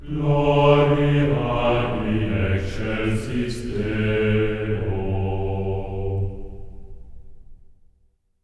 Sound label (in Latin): gloria G3; Spectrasonics; GR GLORIA